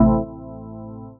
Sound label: deep house organ stab in am